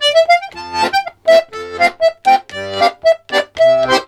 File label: 120 POLK, Accordions; USB Soundscan vol.40; AKAI